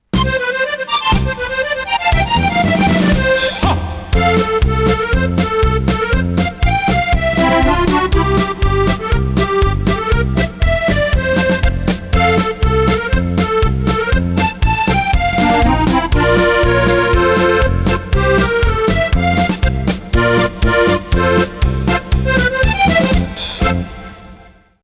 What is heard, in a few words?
polka